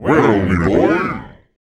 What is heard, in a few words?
VOICE FX, Dance Vocals